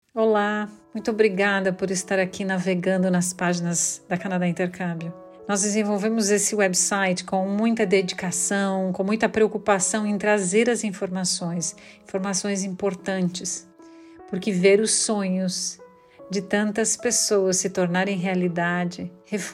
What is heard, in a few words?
piano